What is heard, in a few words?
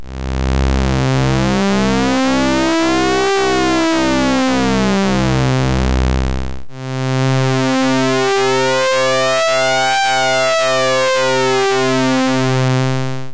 hard sync